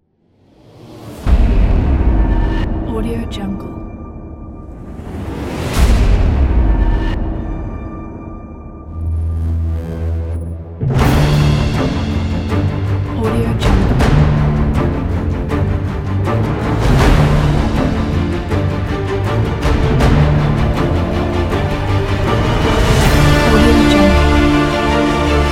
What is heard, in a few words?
blockbuster trailer